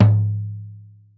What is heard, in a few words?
floor